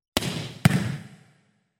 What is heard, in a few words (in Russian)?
dva udara v volejbole